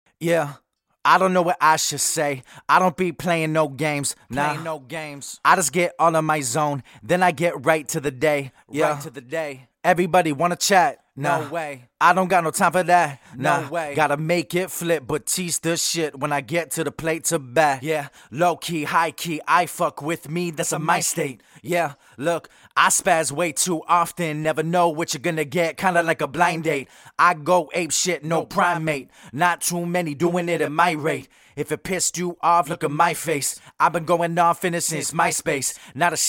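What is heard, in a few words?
hip hop 110 FM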